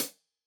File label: Hihat Closed